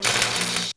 welding; electrical sparks

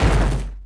metal crunch med; objects; Star Wars Obi-Wan; XBOX

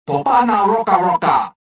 escaping

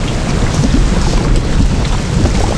sewagepipeloop; water; Tony Hawk's Project 8; XBOX

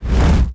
flaming Axe swing; weapons

